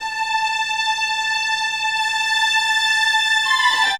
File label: STRG E6; DANCE; STRINGS; MAGIX